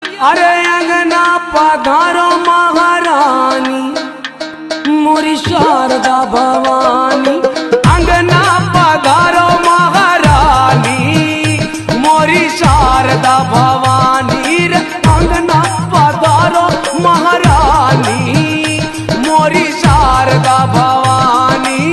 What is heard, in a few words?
Ringtone